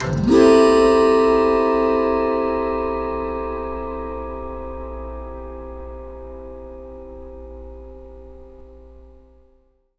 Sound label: gamelan chord, angklung; traditional